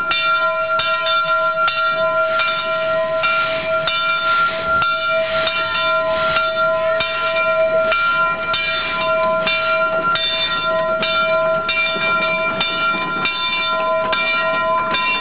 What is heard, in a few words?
bell